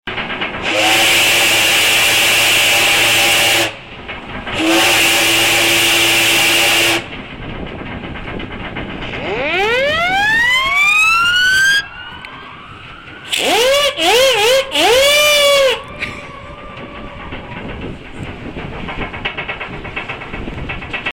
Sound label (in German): Dampfpfeiffe, eisbrecher; STETTIN